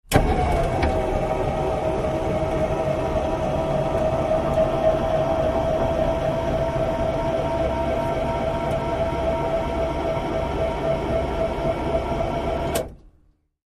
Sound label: Humvee: Trunk Pneumatics On / Off.